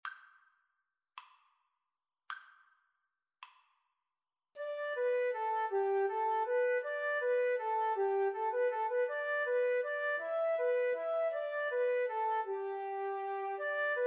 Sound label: grieg morning, Flute Cello Duet